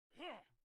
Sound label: Human Aggro Sound